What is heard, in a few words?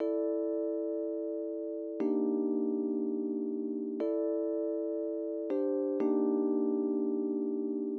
120 rhodes, Keys, Nu Jazz House; PowerFX